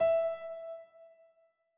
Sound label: Piano (E4)